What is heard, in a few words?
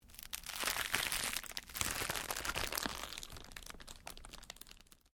Cellophane crinkle; Material components for constructing more complex sounds; Plastic; Sound Effects:Materials